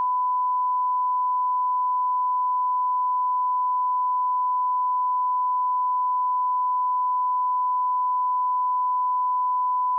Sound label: 1khz 10sec